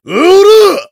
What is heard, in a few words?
heavy meleeing